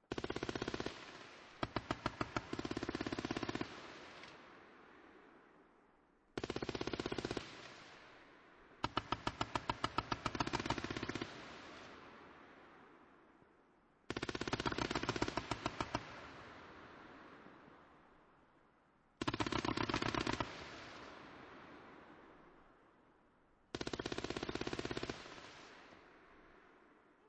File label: Ambient Field Fire, Battlefield; Battlefields; War; VRSonic